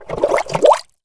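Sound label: Bubbles Small Surface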